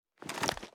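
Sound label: foley assault rifle ak47 inspect; weapons; wfoly ar ak47; viper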